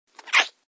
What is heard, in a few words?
Kiss